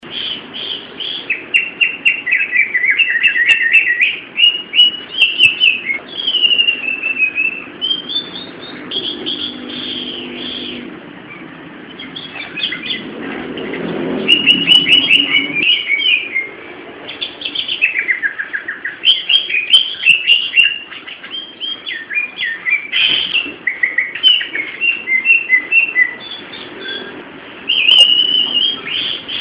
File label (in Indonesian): audio cucak hijau gacor